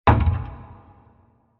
Heavy Wood Impact With Reverb; Blasts; Impacts; Sound Effects:Impacts; CraftedSignals

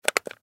Button Switch

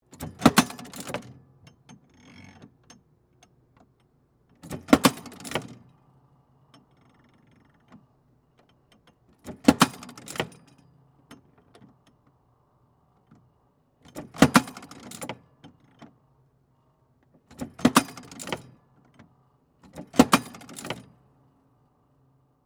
Engraving Machine, Industry, Stamp, Sequence; Stamper

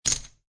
Poker Chips Drop Two Chips Onto Another Two Chips; Gambling Sound Effects

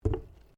Bottle Plastic Place Bench; Various bottles; Materials; SoundEffects-Foundation1

